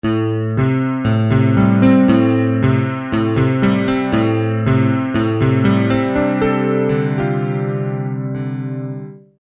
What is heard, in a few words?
Guitar